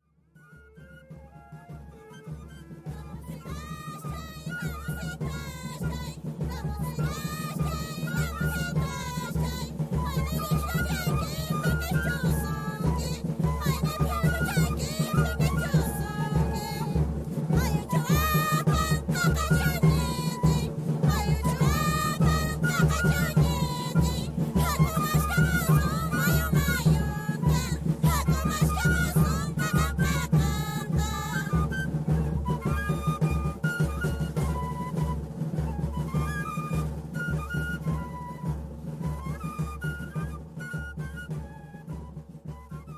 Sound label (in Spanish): Toque Waynu